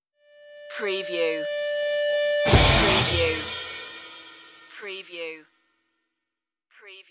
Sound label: drop that beat stinger